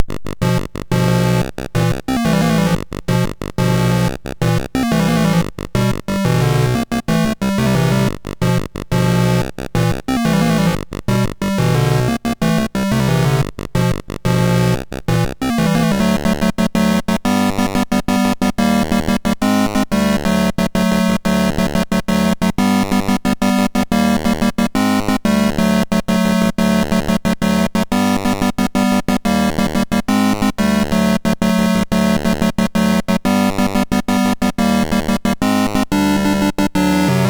sid MOS6581R3; Perry Mason Mandarin Murder

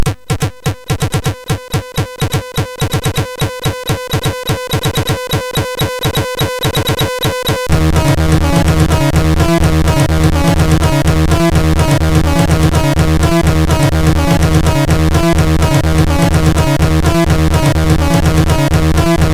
Mad Tune, MOS6581; MOS6581R3; hvsc

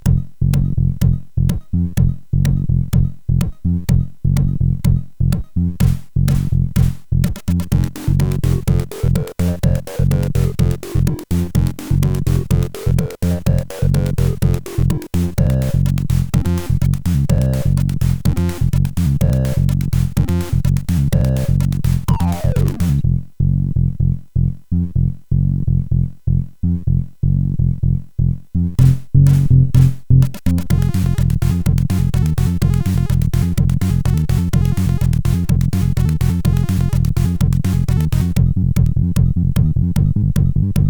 Brutal Techno, sid CSG8580R5; hvsc

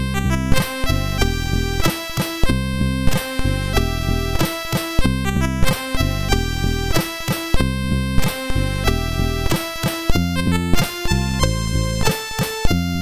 Blues, MOS6581R4

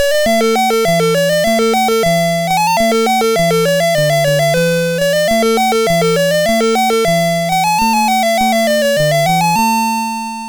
sid MOS6581R3, GAMES; Alice in Wonderland; hvsc

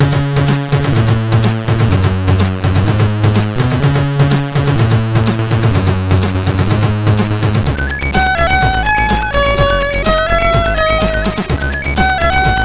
Riff, CSG8580R5; sid

